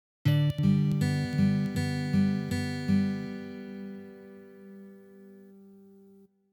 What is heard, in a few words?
Drone Notes